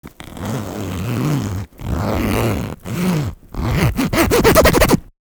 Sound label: Zipper